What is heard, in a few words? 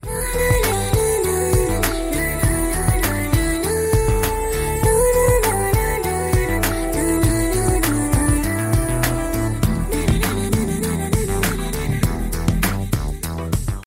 Romantic Song